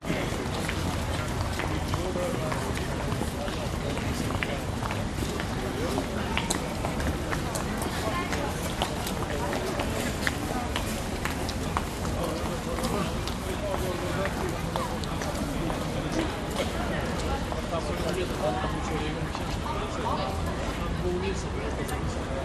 stockholm crowd on medium square with yelling kids